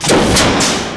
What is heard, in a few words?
door move; scmod; doors